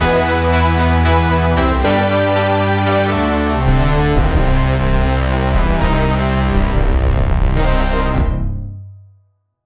early 80s tragic heroism, badass

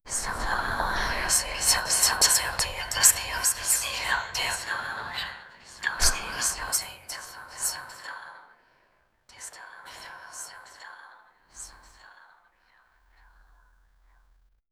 four airy woman voices; mystical

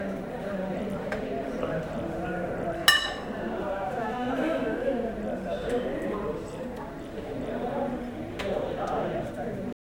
medieval tavern sounds

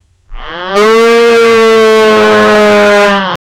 generated the cows sound, livestock

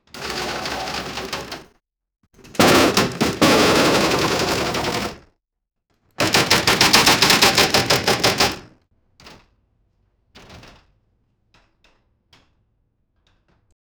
big piece of machine fall; accidents

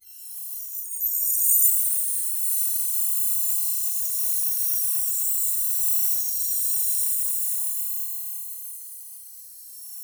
shimmering sparkles gold

